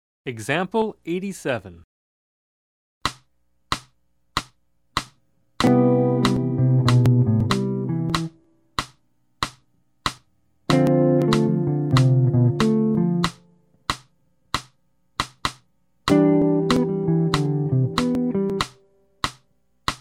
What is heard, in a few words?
Jazz; jue shi ji ta